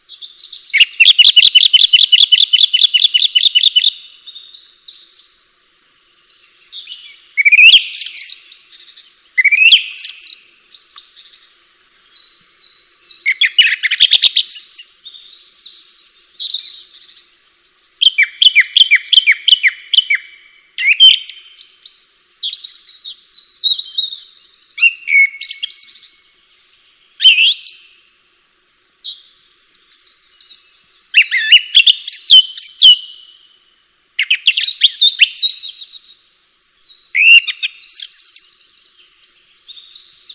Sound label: birds